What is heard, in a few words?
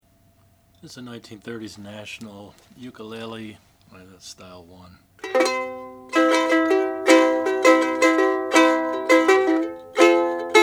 national style 1 plated uke